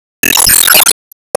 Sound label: Enemy Bigflame Sizeup